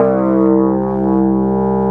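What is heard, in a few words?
Wurlitzer C2 - L; USB Soundscan vol.09; AKAI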